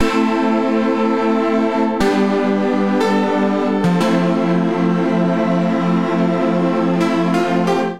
Keys A Minor 120 bpm; Accompaniment; Tonal; MIDI Clips; Past; Drive and Glow; Ableton; Factory Packs

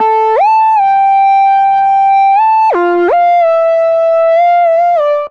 A Minor 92bpm; MIDI Clips; Melodic; The Jump; Ableton; Factory Packs; Chop and Swing

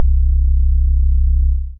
SubEsque Bass; Basses; Ableton; Creative Extensions

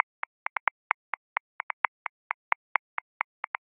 Breakin', Grooves; Ableton; Factory Packs

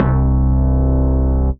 Hausbass; Synth

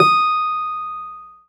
Model-C D#5; Keyboards; Ableton Foundation FreePacks

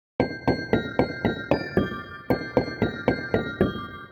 Misc Debris Melody C Minor 115 bpm; MIDI Clips; Tonal; Ableton; Factory Packs; Lost and Found

